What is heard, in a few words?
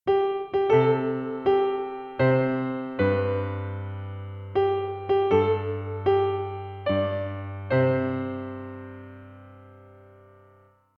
happy